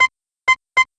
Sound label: 4 beep; matilda